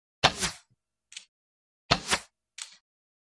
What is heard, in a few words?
Serrated Wood Arrow, bow & arrow